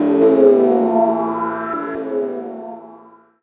KEY SHOT TEKNO 140 A; Techno2; Singleshots

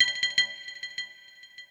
FX Industrial 140

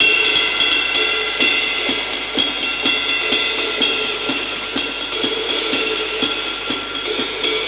125 Classic Breaks, 125

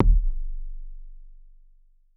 C1 Tuned Kick; Touch Loops; ElementsOne; Abstract Rhythms

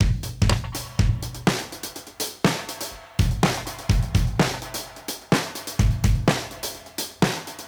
125 Sprung Breaks; Loops; Touch Loops; ClassicBreakSessions

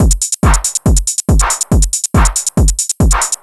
TECHNO BEATS 140

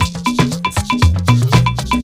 SAMBA 118, loop; 115-121 BPM

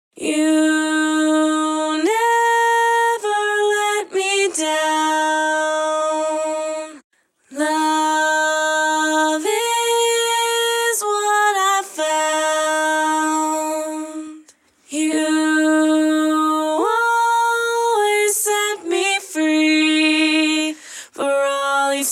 lead

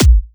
kick, drum hits; Anthemic

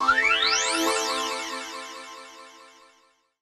KEY SHOT TEKNO 140 A; Techno2; Singleshots

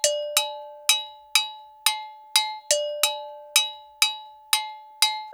ETHNO EXTRA 90; ethno2

